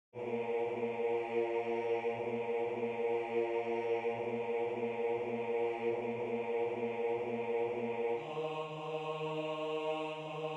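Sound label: Bass, Religious Folk Songs Of the Negro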